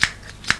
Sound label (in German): schere; Little Red Riding Hood